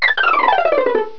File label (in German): Treppe